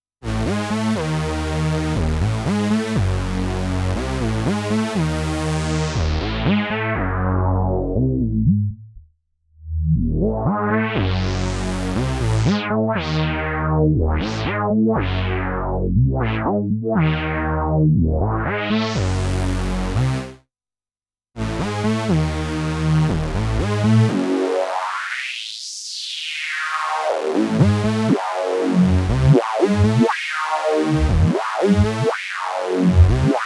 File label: All Filter Types; Korg SVF